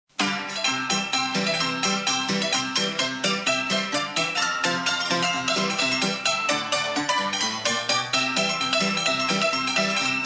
piano

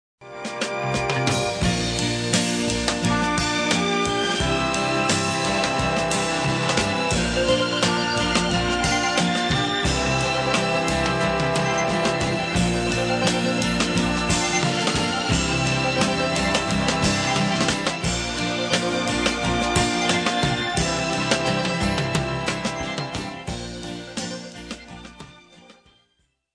end show music; Steve Wright

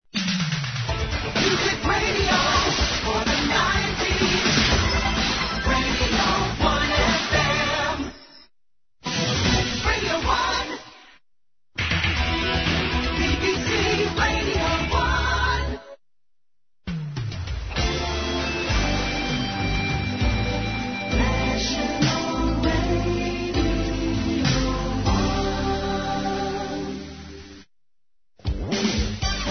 1990s jingles set

